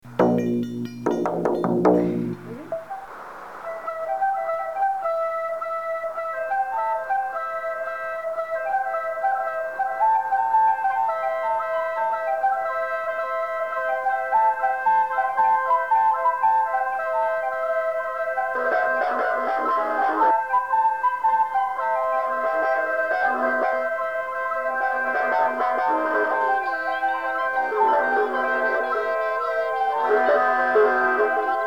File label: Ready Flutes; Horror FLutes international instruments